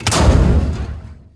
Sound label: crate clunk; doors elevators; Star Wars Obi-Wan; XBOX